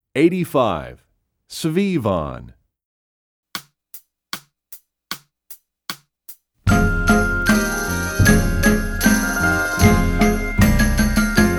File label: S Vivon, Percussion; EE2000 Book2